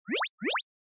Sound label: Anime Motion Double